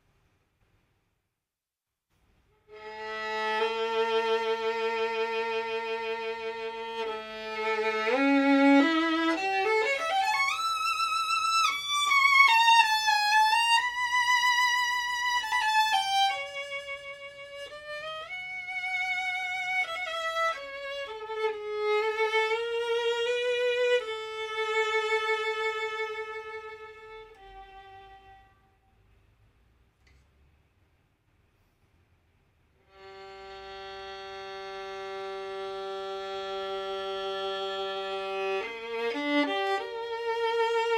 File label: Classic, Professional Violin